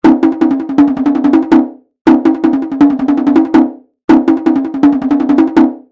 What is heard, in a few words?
indian percussion ghatam